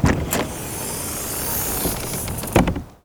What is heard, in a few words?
HATCH OPEN, Cars; 1000fx